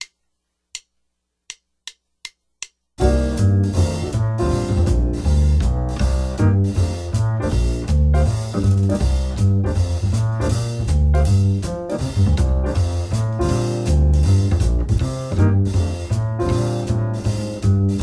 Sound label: Cm7 F7 Bbmaj7 (2bars) 160